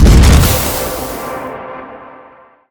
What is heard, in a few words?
propulsion zap, weapons; deluge